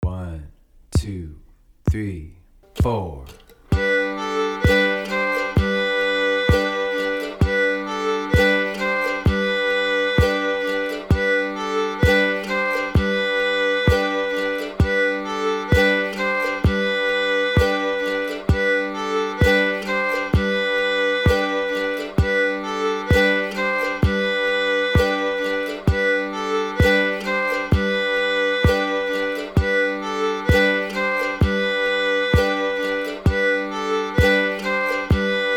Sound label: practice loops, fiddle; second bar loop